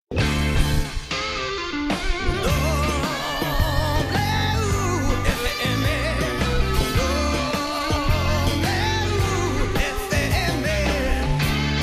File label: Jingle